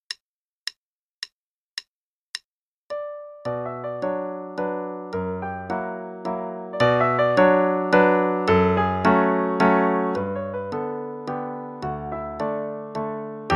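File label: Play-a-long, Mazurka, quarter note=108; Chopin; Op 30 No 2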